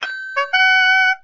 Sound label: Token Win